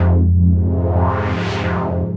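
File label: 110 bpm, Melodic Loops; M8; Electronisounds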